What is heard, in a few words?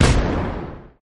blast pickup; Skillzgaming; mega Money Rush Desktop